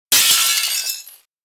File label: grass